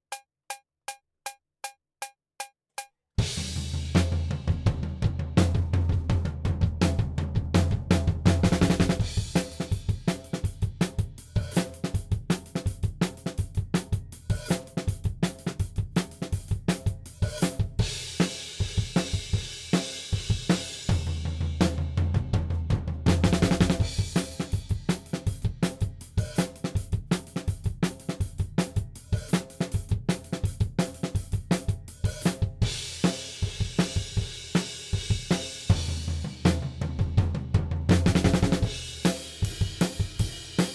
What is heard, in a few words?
Drums ONLY; Pre Pro